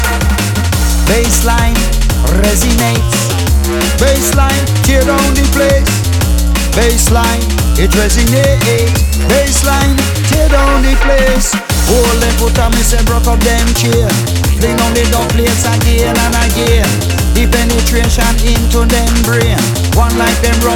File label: Drum Bass Remix